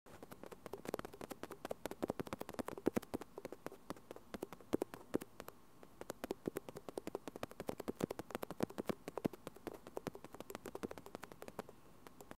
microphone asmr; ضربه زدن به میکروفون; اسمر

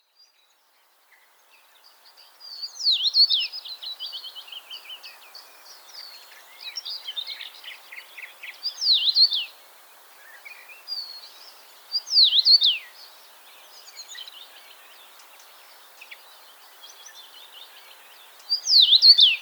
Common Rosefinch; پرنده توت خورک

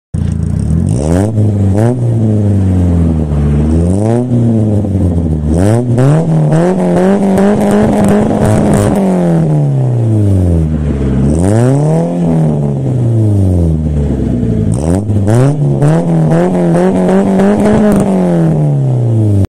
Tornado exhaust

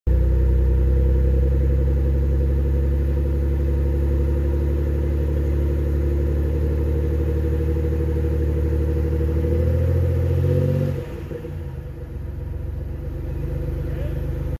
Buick; اگزوز

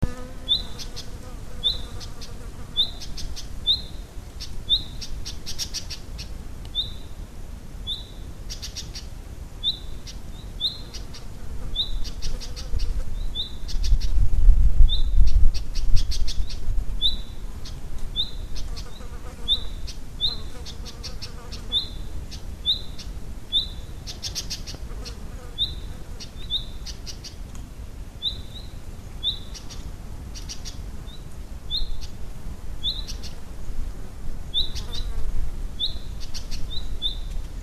Familiar chat

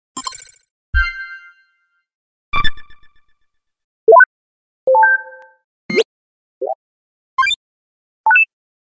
Digital button

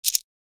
button

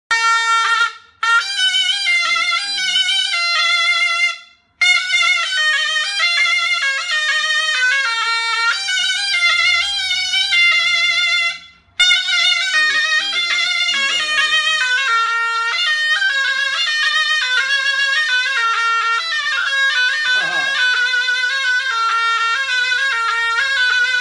Zurna

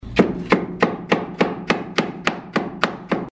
carpet weaving; قالی بافی

